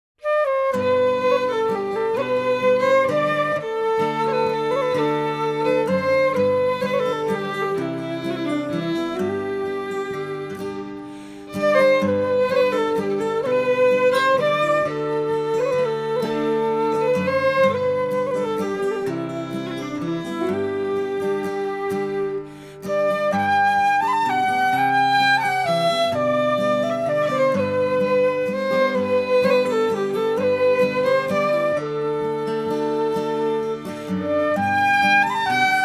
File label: Southwind trio, celtic duo